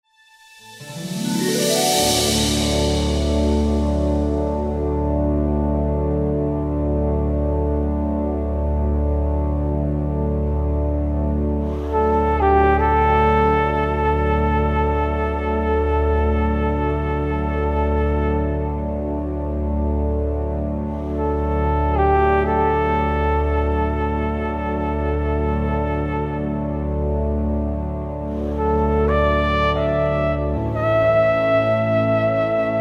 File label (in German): Märchenhafte Entspannungsmusik